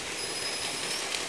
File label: call S17, OrcaCalls, SRKW; Alwin